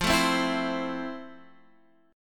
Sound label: F Major 7th F